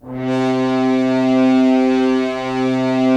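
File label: Solo French Horn, brass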